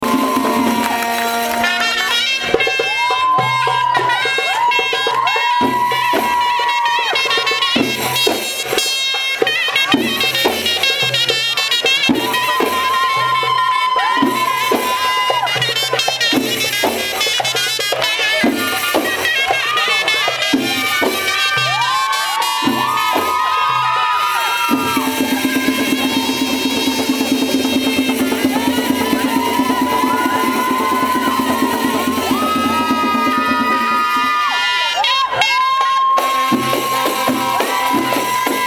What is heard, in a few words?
Egyptian Mizmar, at wedding